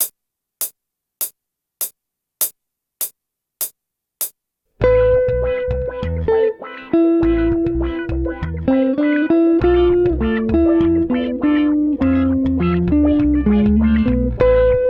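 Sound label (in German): Punkt. 8tel Play Along ohne Drums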